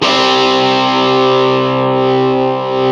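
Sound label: GTR CRUNCH, Metal; Club-50 - Foundations Roland; Metal Chds; Power Chds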